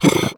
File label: fast out, headcrab breath; reviver